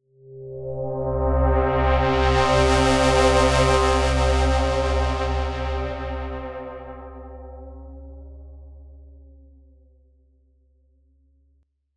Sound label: Absynth Attack Slower A